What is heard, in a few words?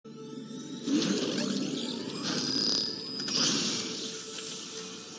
accelerator start